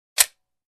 Empty Pistol

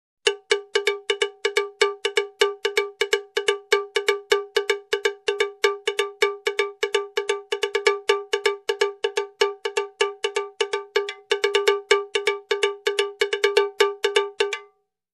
Muted cowbell rhythm

